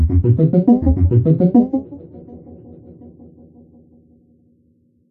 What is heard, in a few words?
Trip Arp; And Yet It Moves demo